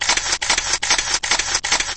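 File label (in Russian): многократная съемка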